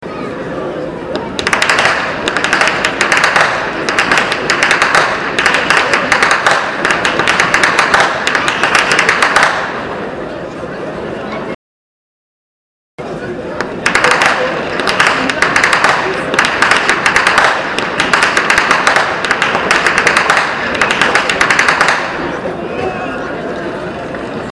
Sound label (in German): schnalzen, jugend; schoenram